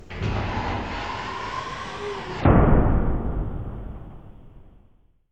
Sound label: Large Mechanical Door